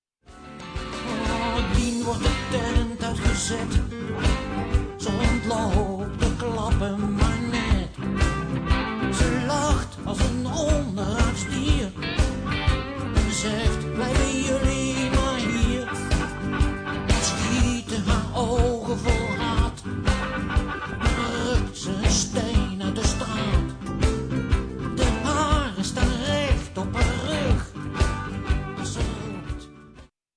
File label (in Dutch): Blues; bij de deur waar de man staat